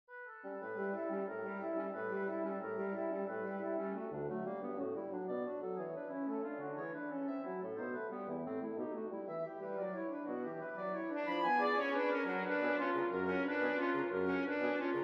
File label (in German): molto allegro d-dur; 24 preludes op. 28